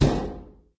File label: Item Put